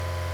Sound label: engine; Race